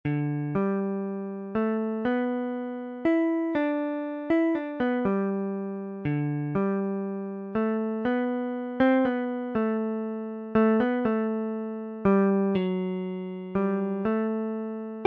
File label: dobro